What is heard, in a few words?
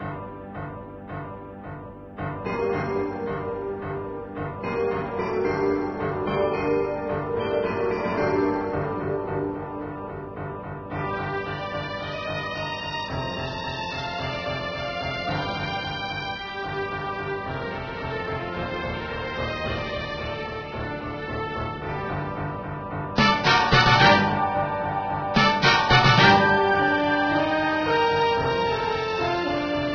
liftoff; race-into-space